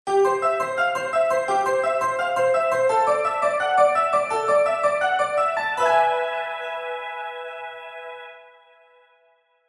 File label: hassya melody